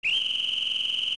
Whistle

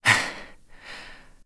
Recover Lower; recovering from lower stamina; Link; Skyward Sword; zelda

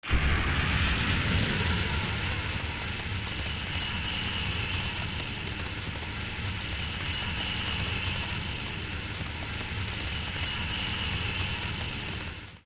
King Dodongo Burn

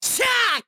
Attack

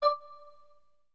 Stamina Gauge Low; The Stamina Gauge getting low, lower, and empty; Skyward Sword; zelda

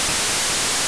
Woosh

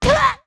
Link Splat; Link landing from a high jump; The Wind Waker; Zelda

